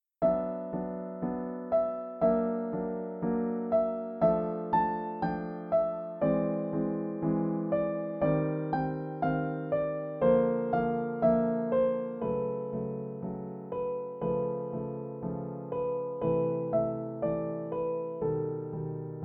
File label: Piano